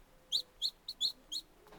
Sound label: chicken chirp; petz